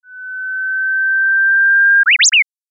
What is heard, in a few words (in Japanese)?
uguisu